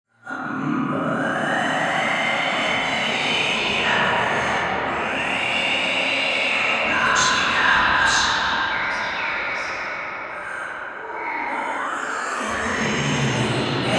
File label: Halloween Whispers; Halloween Gouda Centrum